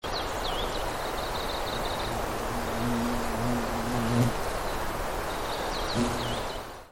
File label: bug